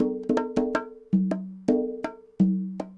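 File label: congas bongos 80 bpm, latin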